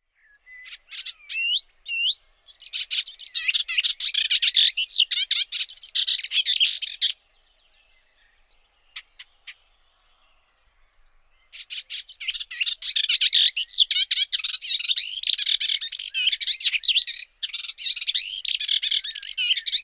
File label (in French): hypolais polyglotte